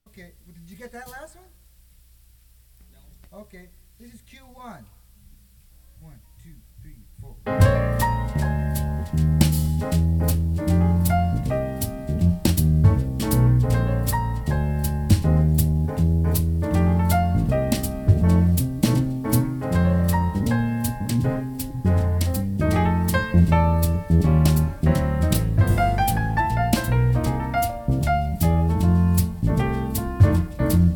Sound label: Blues